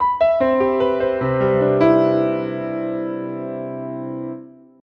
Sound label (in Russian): Triplets, звук клавишных инструментов рок поп